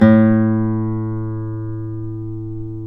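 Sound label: classical guitar, nylon string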